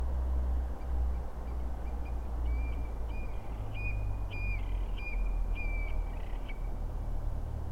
Eurasian stone curlew

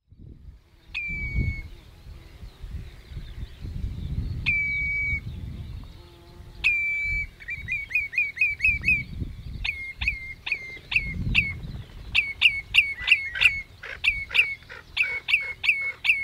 Spotted Redshank; آبچلیک خالدار

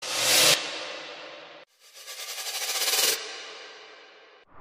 Time change transition

